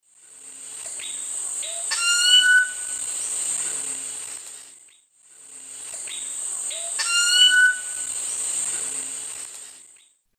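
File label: Cacicus sclateri, calls playback; S. America; Eastern Lowlands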